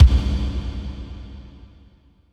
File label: KICK, Tekno Industrial; East West